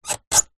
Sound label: household door hatch wooden plastic latch open squeak; zapsplat